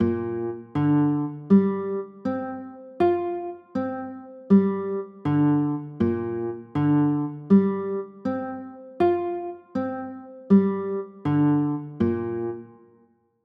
a sharp 6 9, chords

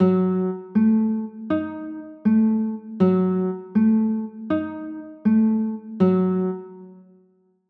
a dim7, chords

